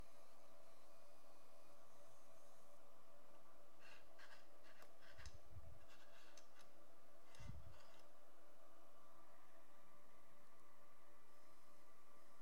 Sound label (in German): gravierlaser